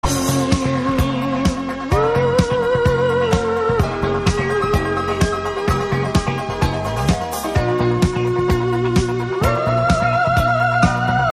german win; dod

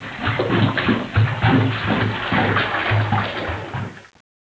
glug

